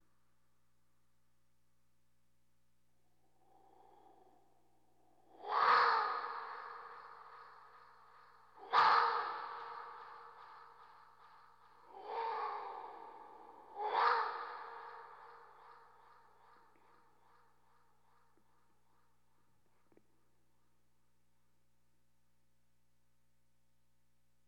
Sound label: Jaguar Whistle Fx